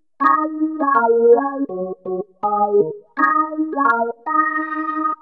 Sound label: Hammond; Hammond-Chile